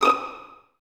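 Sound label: violins D#6 pizzicato, String Ensembles; Miroslav Vitous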